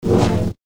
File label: Double Bladed Saber Sound; FXHome